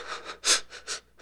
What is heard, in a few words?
Fatigue Light